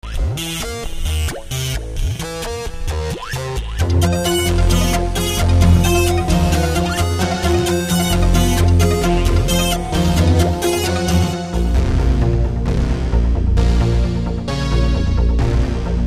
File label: EBM sequences